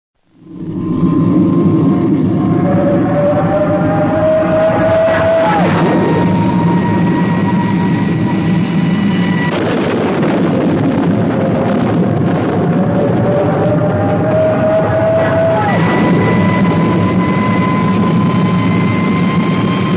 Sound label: Special Effects; Supercar